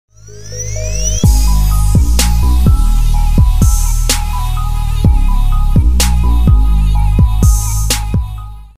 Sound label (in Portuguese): toque